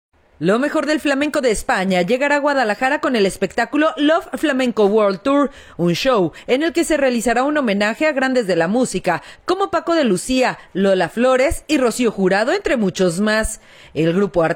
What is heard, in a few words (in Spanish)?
flamenco